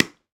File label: Pickelball Paddle Hit Ground Medium, Ball Collision